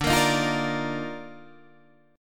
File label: Eb Major 7th Suspended 2nd D